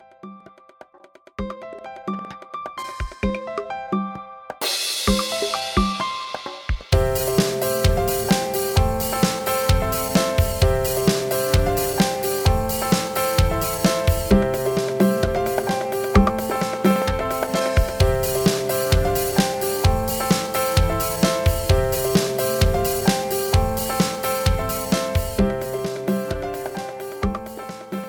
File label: Patter